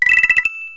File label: coin